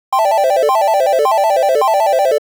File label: win